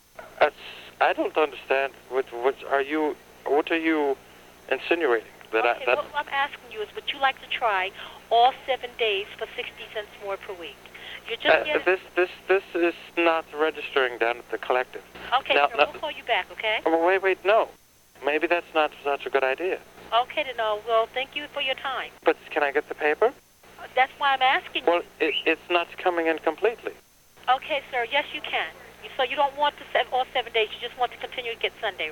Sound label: Call